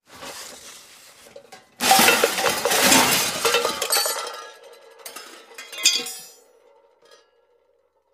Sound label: Junk Box Empty On Ceme, junk crashes